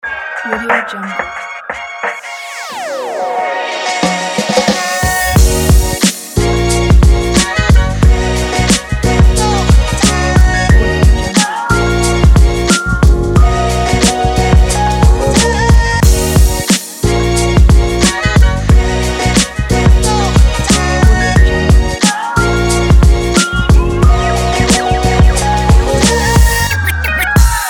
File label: hip to the hop; blockbeatz